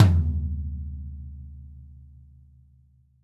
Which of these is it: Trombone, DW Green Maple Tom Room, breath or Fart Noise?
DW Green Maple Tom Room